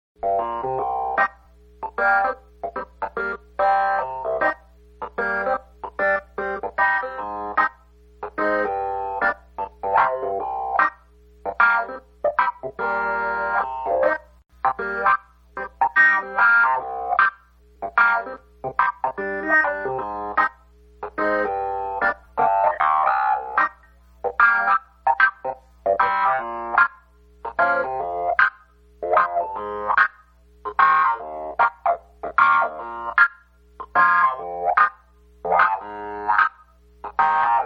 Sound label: clav; multi-track